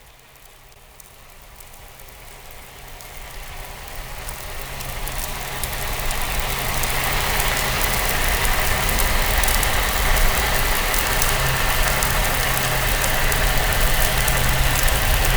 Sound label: boat cap corse; SUBSEASOUNDSCAPES